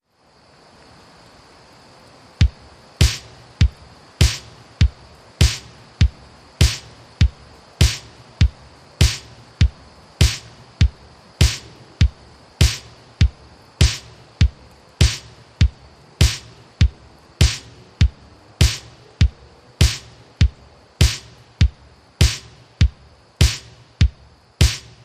Drums

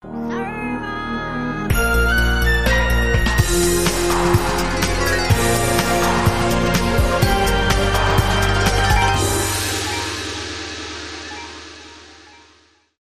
Arabic Theme

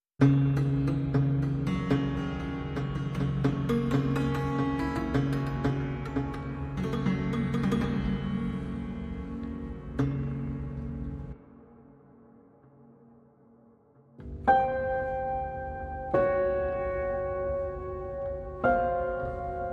Piano Only

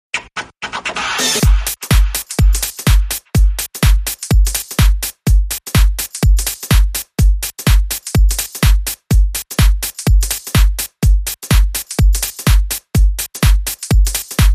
Bone Beat

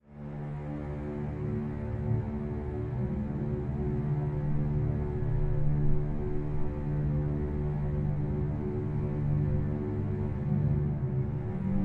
Submerged Drone Light

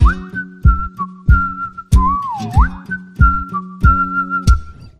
Whistle, Sting

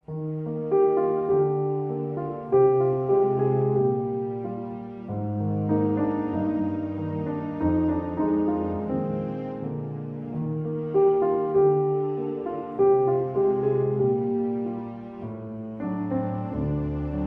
With Strings

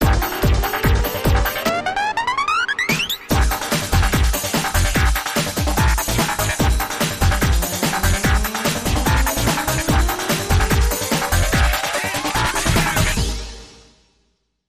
Pinball Groove

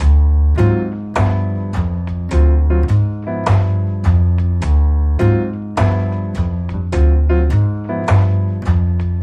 Cool And Sneaky, Loop

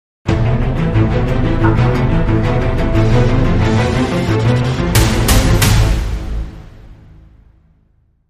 Crime Sting

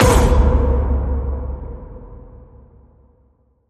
Vocal Fury Warrior Hit

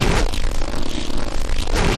Heavy Course Grit Sweep

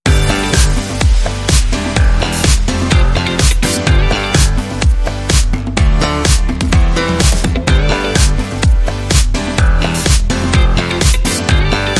Optimistic Lifestyle, No Vocal FX

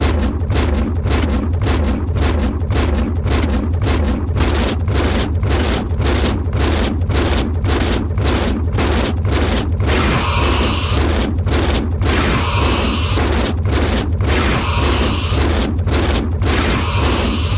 Pulse Mechanized Chomp

